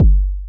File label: heavy distorted techno kick